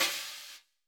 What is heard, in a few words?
brush; Greytsounds; Scott Peers Sound Engineering